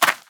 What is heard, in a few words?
land ice; Scrat